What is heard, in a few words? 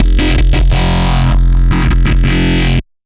Bass F; Loops